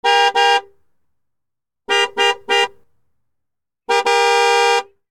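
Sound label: Truck Cherokee Horn; SFX Kit; Trucks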